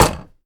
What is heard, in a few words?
Crate Hit; PhysicsPlatformer